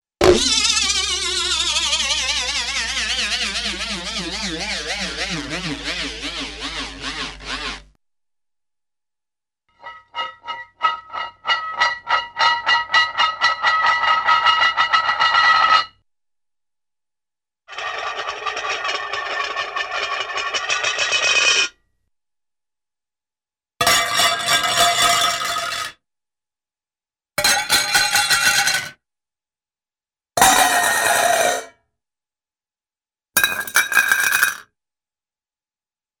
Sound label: Rapidly Spinning Object Slows To Stop - Metal Disk Rolls, cartoon; Hollywood Edge; Cartoon Trax